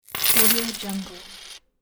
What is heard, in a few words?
coins